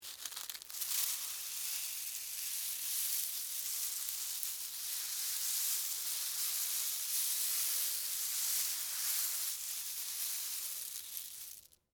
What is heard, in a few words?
Movement Cereal, Texture, Movement, Cereal, Corn, Flakes; Food (Sound Effects:Household); BlastWave